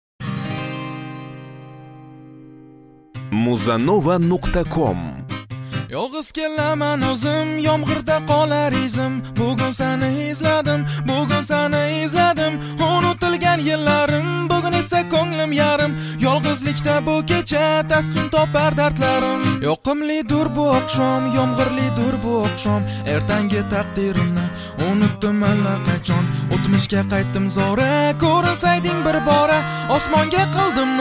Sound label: guitar version